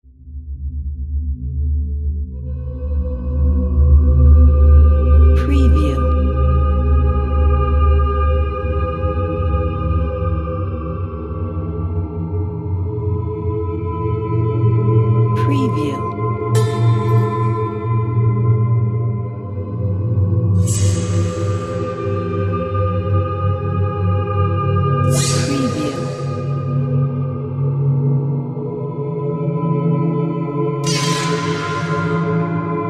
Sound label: dark scary horror atmosphere background